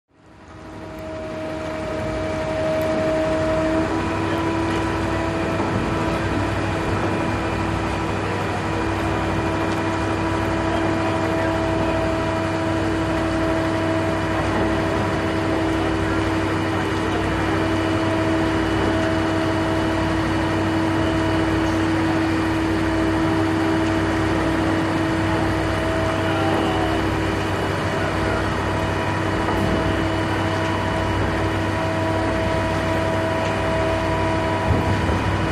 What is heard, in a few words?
Hydroplane Dock cra